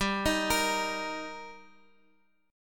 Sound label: G Minor Sharp 5th G, Guitar, Open F; arpeggiated; Gm#5